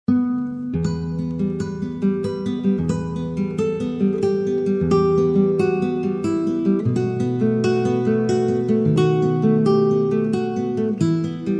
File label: lite easy romance; podcast production toolkit